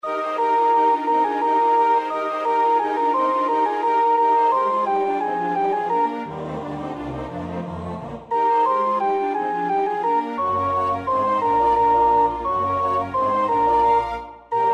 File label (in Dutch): sopraan; Poulenc (Francis); Stabat Mater